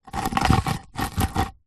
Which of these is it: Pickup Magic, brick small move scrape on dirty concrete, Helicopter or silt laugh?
brick small move scrape on dirty concrete